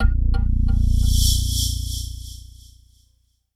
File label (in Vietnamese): Am thanh hoi hop ngan